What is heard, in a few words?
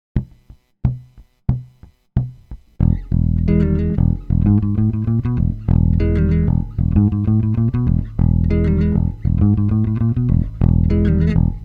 Funk Blues E7